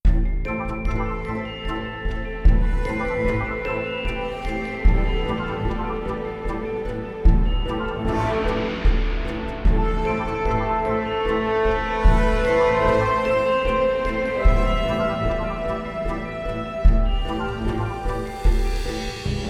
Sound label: adventure outside cave; pirate mysteries android ios macos mobile windows gamerip 2012